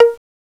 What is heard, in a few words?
PIZZ LOW, STRINGS; STRINGS3